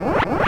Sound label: Retro Bonus Sound; Blastmorph Pinball; xbox series xs